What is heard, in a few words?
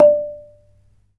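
ranat thum D5; Spectrasonics - Heart of Asia CD2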